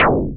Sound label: Metalic Thump